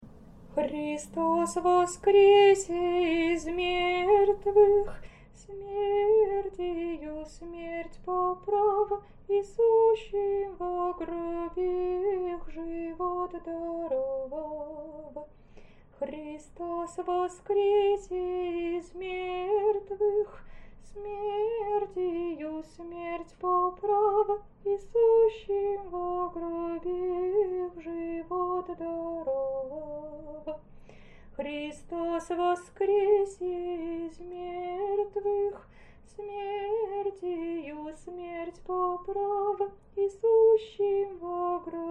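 easter canon byzantine christ is risen 3x slower, singing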